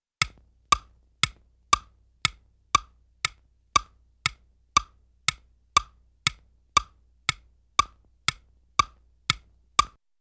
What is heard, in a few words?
Clock Ticks (ie Game Show Timer), cartoon; Hollywood Edge; Cartoon Trax